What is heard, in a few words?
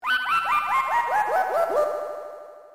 death spell; ff3us